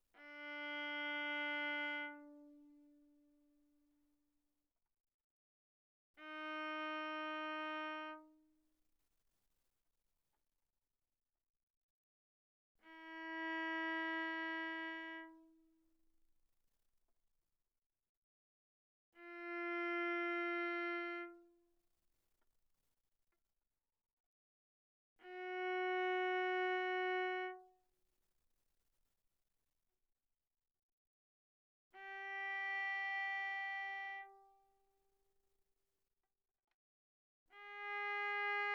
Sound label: Viola arco sul D mf D4 B4